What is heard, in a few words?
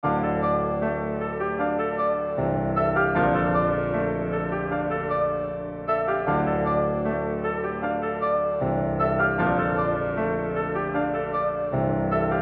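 E minor 154 BPM Piano Keys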